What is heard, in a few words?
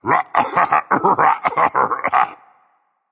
laugh berserk; cuchulainn